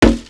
wood; Mafie 1

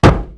car; Mafie 1

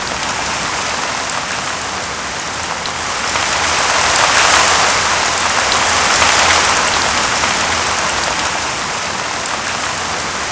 rain door; Mafie 1

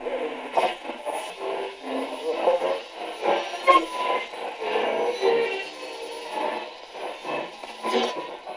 radio; Mafie 1

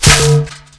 bush; Mafie 1

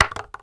wood; Mafie 1

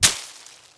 bush; Mafie 1

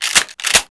shotgun; Mafie 1

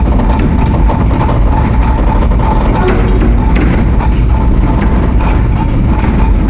bridge down; Mafie 1

